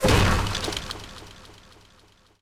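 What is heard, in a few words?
ricochet hit modules, tanks; killscene; War Thunder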